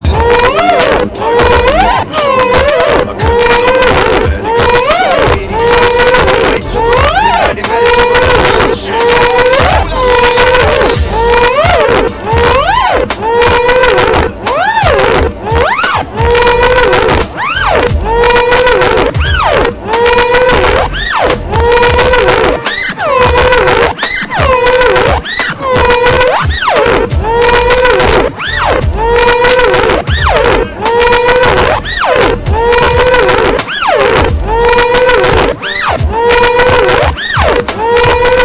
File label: digital scratch